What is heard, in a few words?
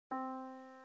yamaha Disk